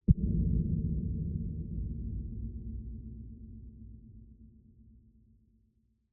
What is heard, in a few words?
Trailer Hit Cavernous Filtered, Low End; 344 Audio; Low Frequency Elements